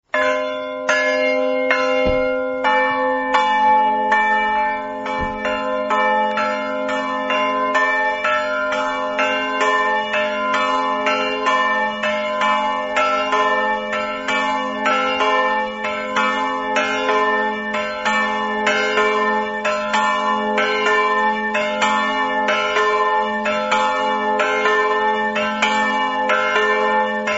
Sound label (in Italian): campane; leproso